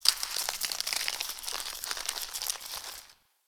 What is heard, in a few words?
Ice Crunch Amb